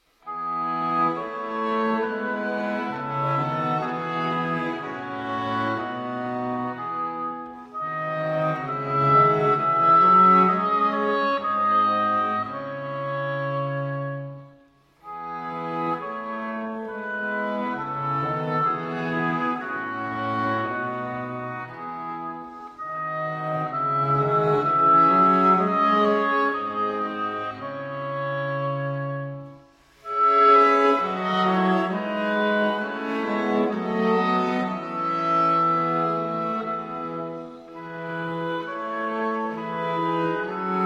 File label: Arr. for Oboe Quartet; St. Matthew Passion; No. 15, Erkenne mich, mein Hüter